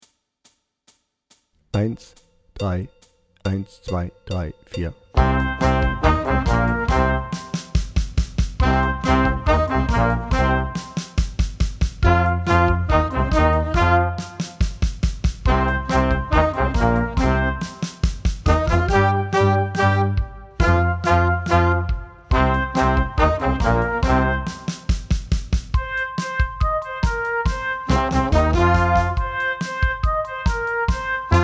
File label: Hard Rock Blues